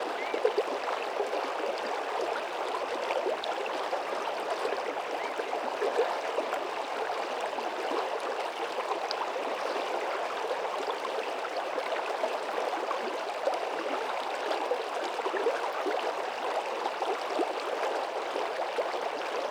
Meltwater Stream under Frozen Lake Gentle

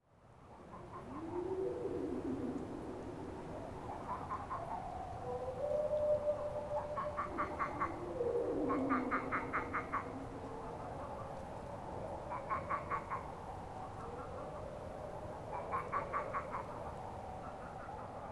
Marsh Pond Ambience General

